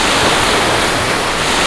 SEASHORE; AKAI S-Series CD-ROM Sound Library VOL-8; SFX

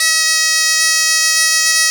BOMB1 E3, BOMBARDE1, Celtic Flavours; USB Soundscan vol.42; AKAI

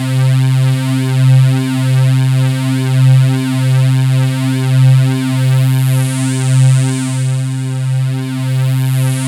C2, Synth Line

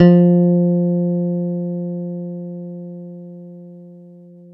PX PICK F 3, Bass; Masterbits CLIMAX 7; PX BASSES

